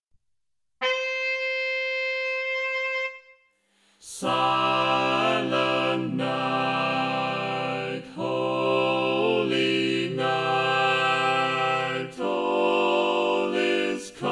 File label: full mix; silent night